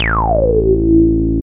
bass; E-Lab; X Static Goldmine 1

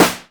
PERC; ZERO-G; Datafile

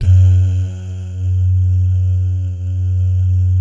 "DAH", Vocal Jazz Fx

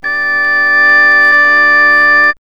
ORGTON

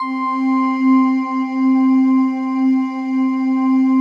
PAD, Pads; Best Service; XX Large

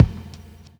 BD WACK; E-Lab; X Static Goldmine 2; akai

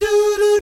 DOO DOOT, Jazz

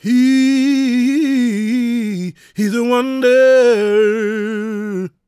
GOSP MALE, Vocal Planet Gospel

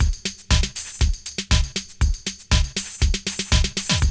break; ZERO-G; Datafile

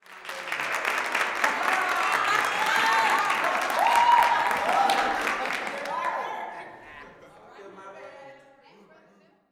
SMALL APPL, Gospel, CHURCH; Spectrasonics; Vocal Planet Vol.1 Gospel; akai

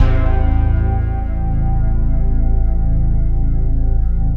SWIRL

